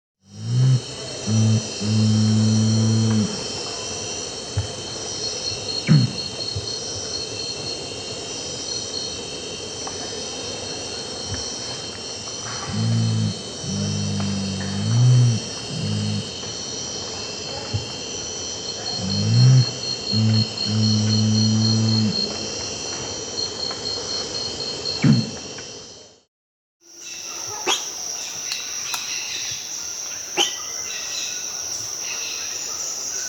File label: Razor-billed Curassow (Mitu tuberosa), Peru; S. America